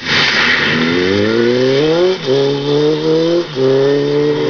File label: wheelie